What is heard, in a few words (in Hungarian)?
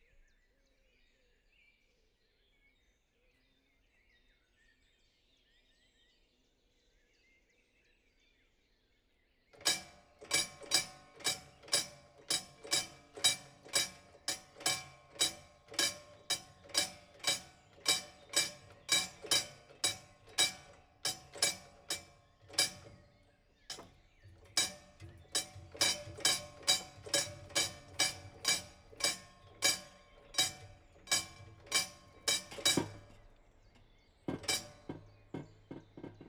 hangjelzésű sorompó, jobbról vonat; vasut; vizhaz; cardioid